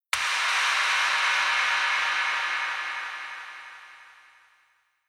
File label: Synth SE Hit